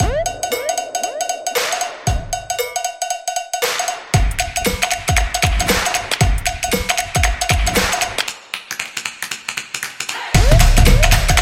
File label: Casual